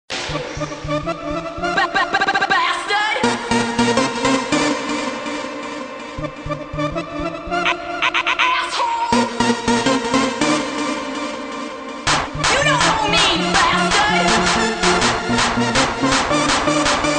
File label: Dj Remixed